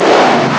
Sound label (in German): Sturm; ST-XX Amiga Soundtracker Sample Packs